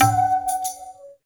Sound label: PRC WHIR, Perc Toys; Spectrasonics; Supreme Beats; World Dance